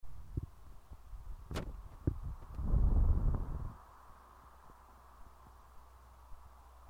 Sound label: fan